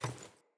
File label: Ladder Climb Wood